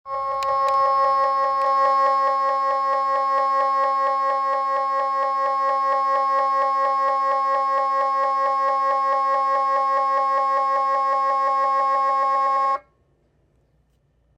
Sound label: MRI Prescan